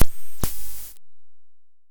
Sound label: glitched tones Feedback FX